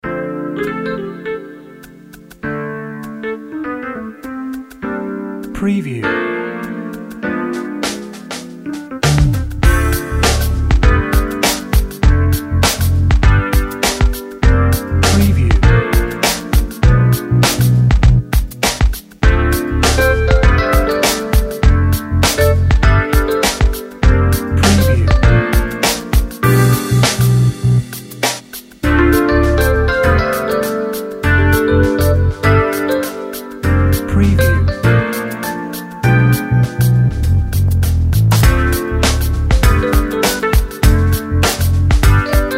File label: Playing Guitar on Valentines Day Soft Jazz